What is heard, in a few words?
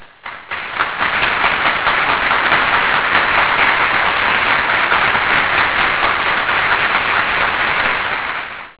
applause